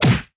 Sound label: Hit; blue archive